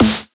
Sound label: clap & snare; HIGHWAY 2; laundry